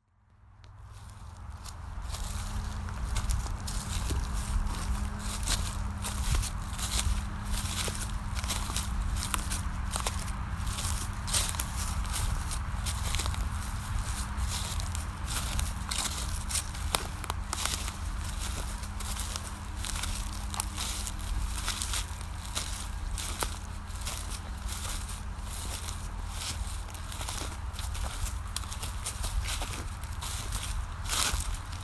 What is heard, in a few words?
Woods; Stainton